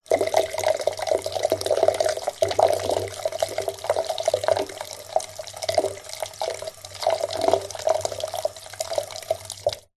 household bathroom sink basin faucet empty tap trickle short